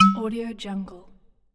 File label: bottle open